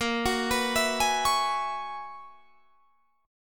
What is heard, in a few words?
Gb 11th Bb